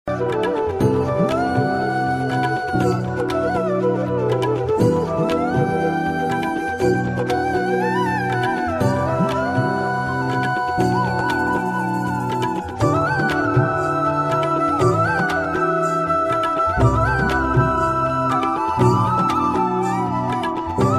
Flute Music

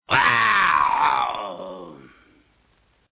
LoFi Synthetic Lion Roar

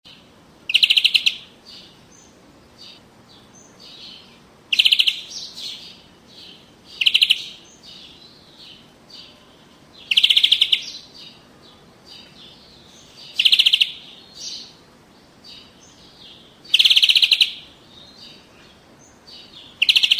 Summer And Birds

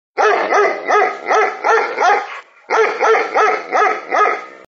Dogs Sound

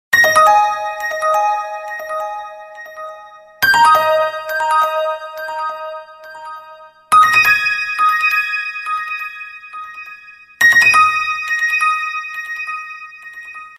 Android Sms

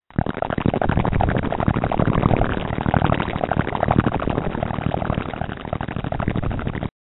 Boiling Water

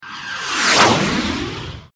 The Sound Of Jets

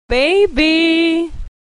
Baby

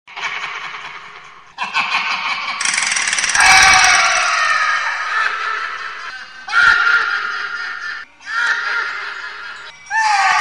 Different Laughter

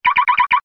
Car Lock Sound

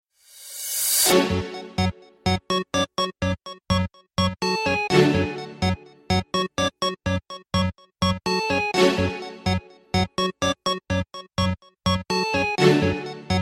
Soothing Beats

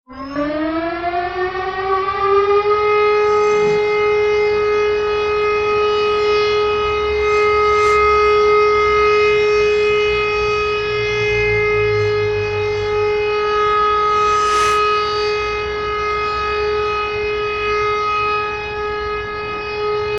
Air Alarm Long Siren

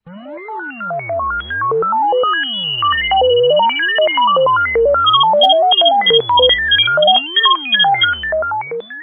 Old Computer Sound

